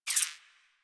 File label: Scope Gun Zoom Out; Crp Scope Gun